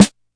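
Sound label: classic hip hop snare; Classic Hip Hop Mega Kit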